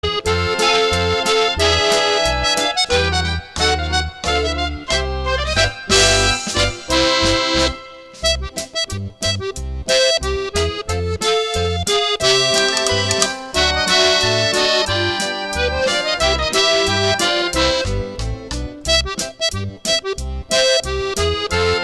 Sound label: Accordion